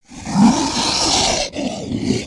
Exotic Lizard Speak; creatures pets

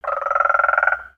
Pets Tortoise Happy; creatures; Lego

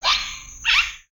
Bird Amazon One shots; Lego; ambience

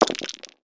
random DICE

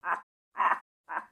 Pets Skunk Idle Bored; creatures; Lego

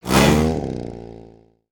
Space Marauder Swing; Valiant; aronwk collection; ndaudio

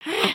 stretch, emotes

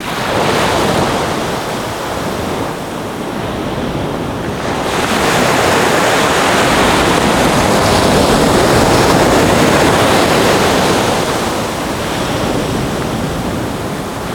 Waves; gnarled forest

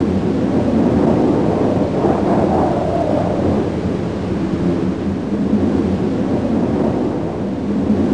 wind loop; ninjago

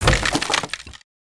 Pet Rock Dog House Smash; destructibles

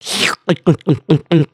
drink

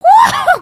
excited, emotes

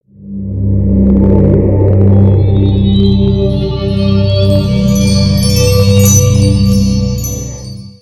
Binocular Path, ambience gnarled forest

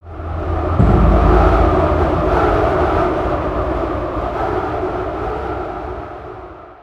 Deep Cave Ambience

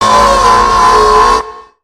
Robot Stunned; creatures mechanical; Lego

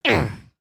Run; Lego Strombie; creatures organic

